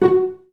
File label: CL PIZZ F3; Best Service; Peter Siedlaczek's Advanced Orchestra